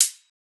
hat, drum sample; Hats

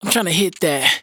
Dry Vocals hook Hit That; Raw Vocals; Slate Digital Omnivox Vocal Sample Pack

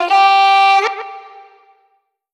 Omnivox oneshot Am, Vocal; One Shots; Slate Digital Omnivox Vocal Sample Pack

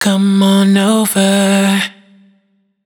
hook 82 Dm wet, Vocal; Slate Digital Omnivox Vocal Sample Pack

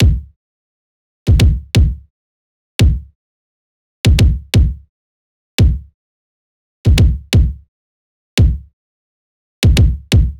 kick loop 86; Pop; Drums; Loops; Slate; SD PASADENA Pop Sample Pack

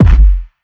Kick Rip, 808 Kicks; Slate; Vintage Drum Machines Volume 2 808 Edition

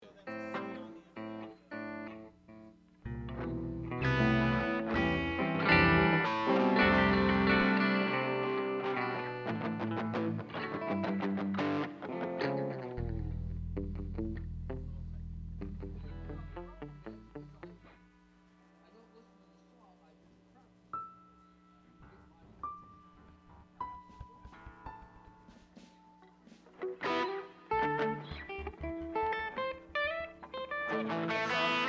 Strings Mix

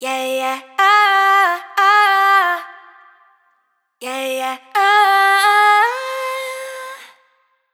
riff Yaya 120 Fm wet, Vocal; Slate Digital Omnivox Vocal Sample Pack

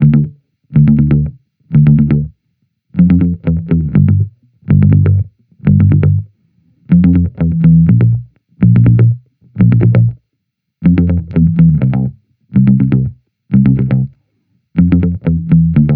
Amped loop Rapid 122 Dm, Electric Bass Loops; Slate Digital BASSHOLE Sample Pack

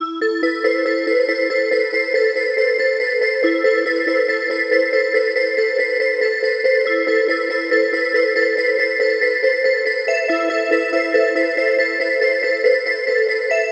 songstarter 140 Am; Slate; SD PASADENA Pop Sample Pack

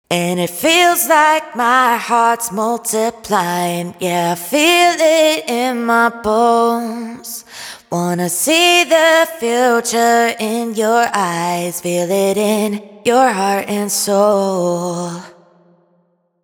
hook Feels Like 123 F wet, Vocal; Slate Digital Omnivox Vocal Sample Pack

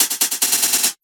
hat, drum sample; Drum Sample Pack; One Shots; Hats; moduglitch; Slate; SEISMIC

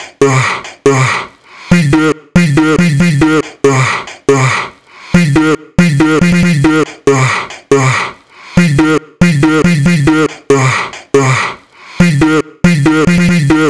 Vocal, chop Beat Up 140 C#; Slate Digital Omnivox Vocal Sample Pack; Chops

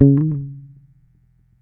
Bass one shot Slide C#, Electric Bass; Slate Digital BASSHOLE Sample Pack

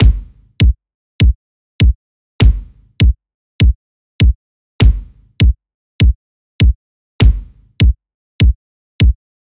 kick loop 100; Pop; Drums; Kick Loops; Slate; SD PASADENA Pop Sample Pack

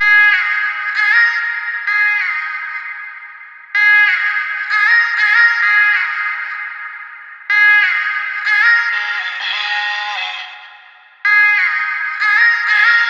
vocal chop, 128, Ebm; Grande; Slate Digital Omnivox Vocal Sample Pack; Chops

